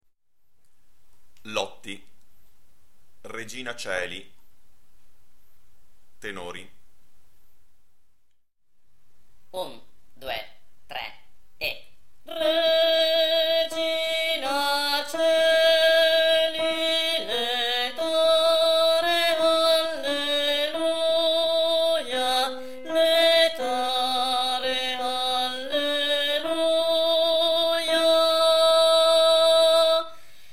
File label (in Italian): Tenori; Regina Coeli